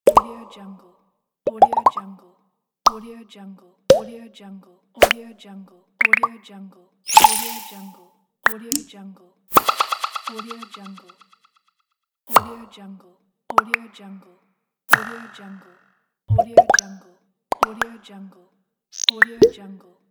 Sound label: pop up